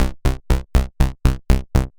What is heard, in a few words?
step sequencer 8 steps